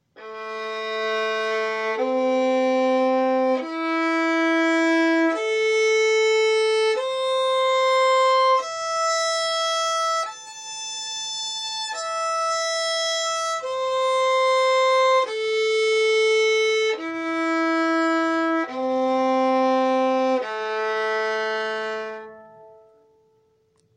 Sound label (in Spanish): Arpegio en la menor